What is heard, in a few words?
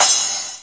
909 Crash; DRUM MACHINES; 909 Extended